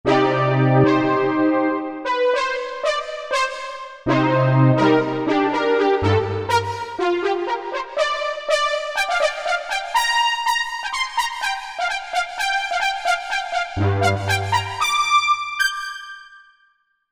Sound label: 2 voices; Korg MSC-12S Synth 3